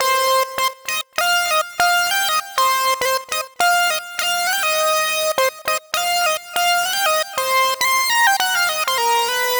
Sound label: Funky lead; 70’s Funk